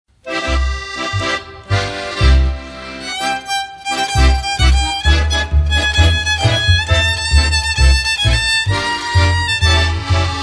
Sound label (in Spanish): ensamble de tango